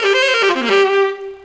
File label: Sax Riffdown; Sonic Foundry (Sony Creative Software); Knutrix Drum & Bass